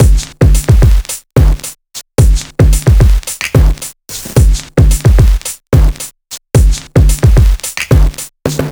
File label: Lo Fi Kit C, electronica